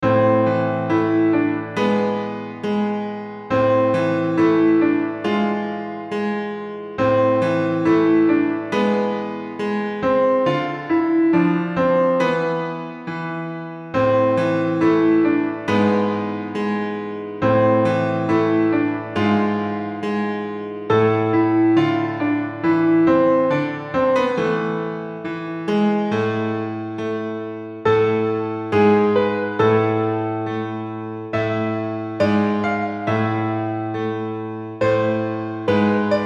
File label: Creepy Theme; Black Home